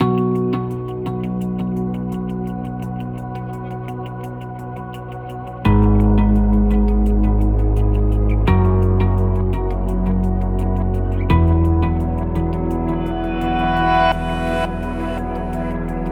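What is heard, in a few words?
Music Ambient, Ambient Post Race 85bpm; Forza Motorsport 6 Apex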